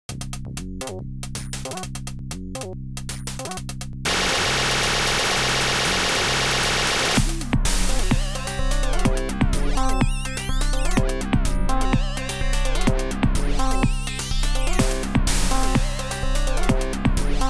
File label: FM Acid; Groovemaster303